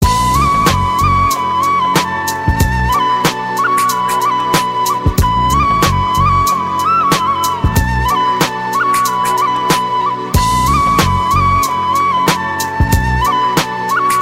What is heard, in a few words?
Love Tone